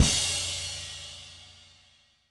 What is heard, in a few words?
end victory; zj the ball